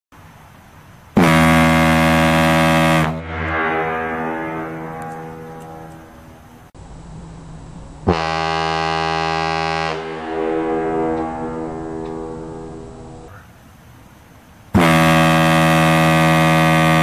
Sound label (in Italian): Sirena nave